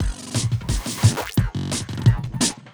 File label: Glitched Beat 175BPM; glitch kit